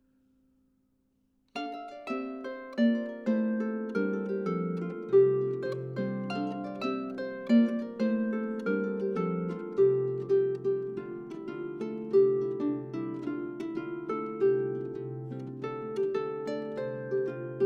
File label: Wendish Rhythm; Hoerbeispiel